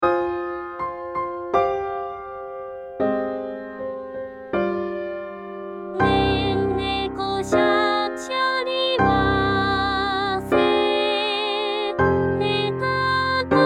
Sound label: female sop